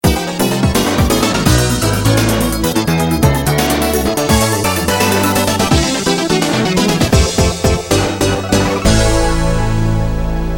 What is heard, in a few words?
Victory; Air Force Delta